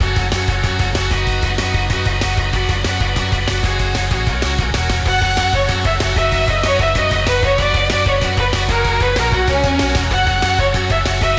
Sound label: battle loop; A1赛道04海底研究所; Peachy Derby